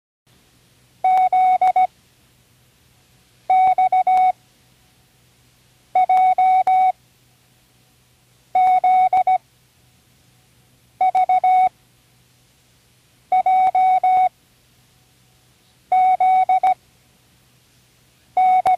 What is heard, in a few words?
random, Learning Morse Code; Tune in the World